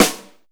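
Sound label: snare drum room; East West; Global Sounds; RY30 DRUMS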